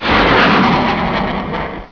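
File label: MISSILE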